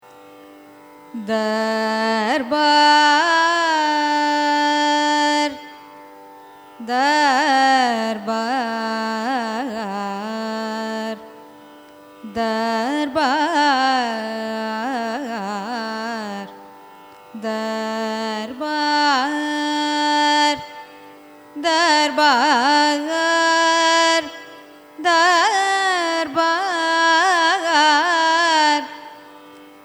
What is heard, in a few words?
raga darbar signature, carnatic music